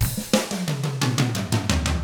116 SHUFFLER, Drums

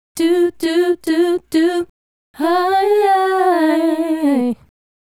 Female Group, Hip-Hop and RnB Vocals

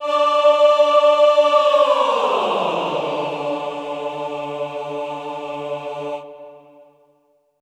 GLIS D3-D4, Classical Choir; Best Service; Extended Classical Choir; GLISSANDI

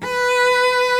B3 cello; InVision Interactive; Lightware VOL-1

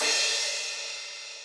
crash, Zoom MRT-3B

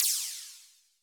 Drums; Distored Reality

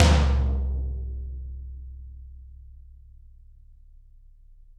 Toms, Drums; Bob Clearmountain Drums I

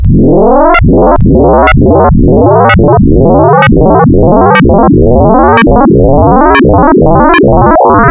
FM 2 Sine Cosine; Frequency Modulation; wavetables; Architecture 32

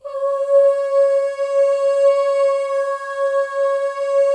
MOVING V1, Symphony of Voices; Spectrasonics

